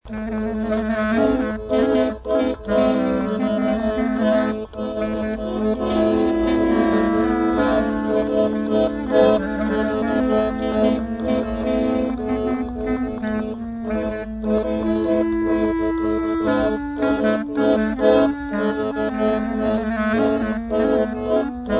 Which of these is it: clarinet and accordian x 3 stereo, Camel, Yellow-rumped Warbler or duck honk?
clarinet and accordian x 3 stereo